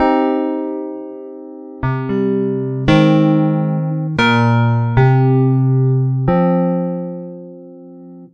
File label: Melody, IDM